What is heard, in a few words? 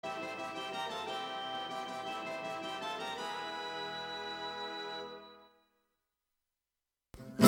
Fanfare; Pole Position II